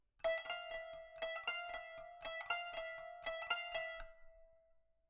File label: kanun